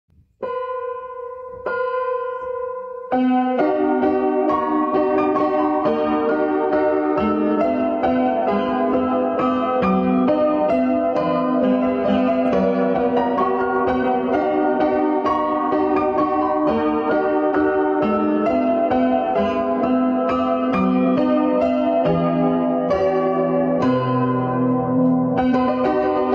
an out of tune piano; Mad Father

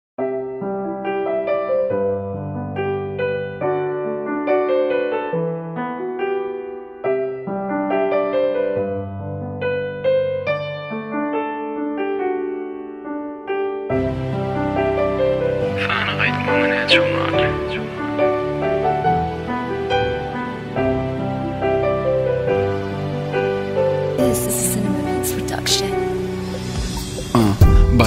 turkmen rap

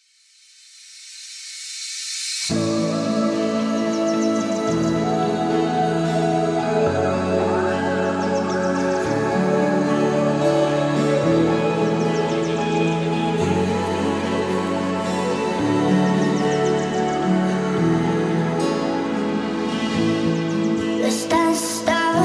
beat slow remix

